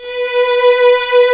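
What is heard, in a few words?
strings, 8pc; String Master Series; Vlns 2-5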